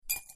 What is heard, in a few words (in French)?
glacon verre